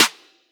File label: snare; 7rystan's Snare Pack 2018